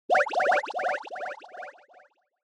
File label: Fantasize Short; シーン・妄想